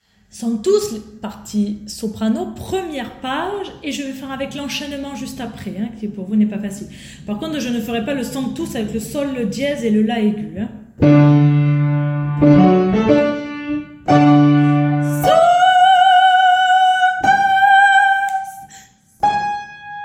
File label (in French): partie soprano; Sanctus début